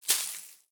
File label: minigame grass; ui; ryza